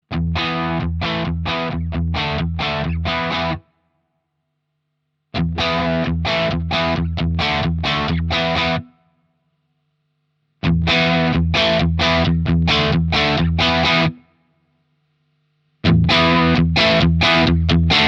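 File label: Spectrum Check, guitar overdrive; Nobels ODR-Mini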